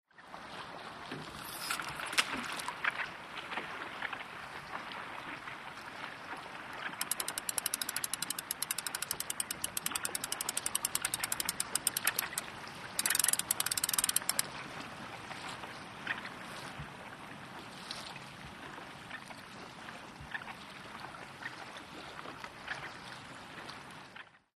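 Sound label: Fish Reel Short Cast, fishing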